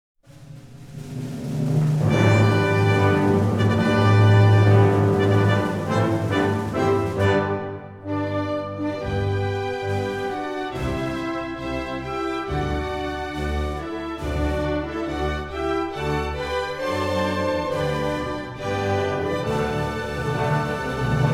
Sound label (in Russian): gimn irana